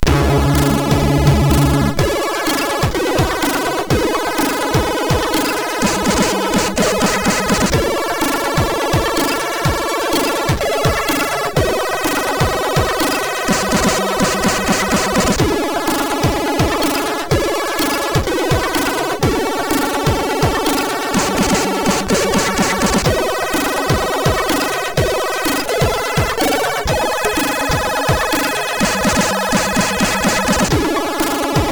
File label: Hawkeye loader, MOS6581R4